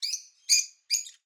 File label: agapornis single call; Sonniss GDC